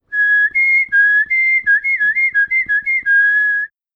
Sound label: warbling